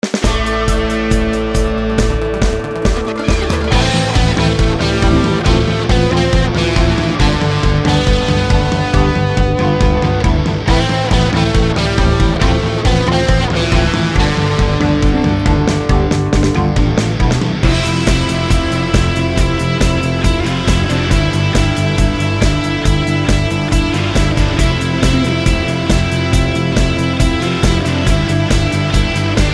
struggle and triumph

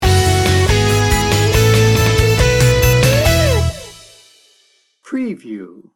optimistic pop rock sting bumper

